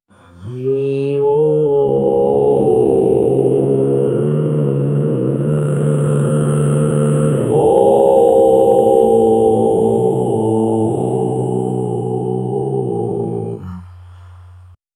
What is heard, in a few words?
a long and deep whale